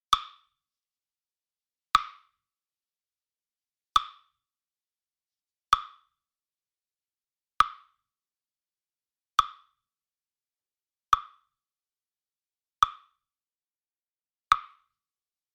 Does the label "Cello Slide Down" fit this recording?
No